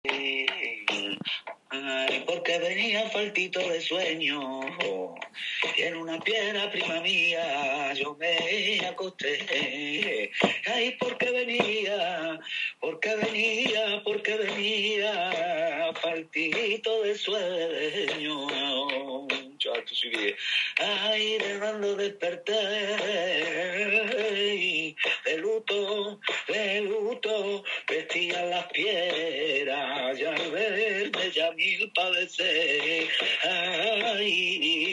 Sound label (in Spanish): letra fandango libre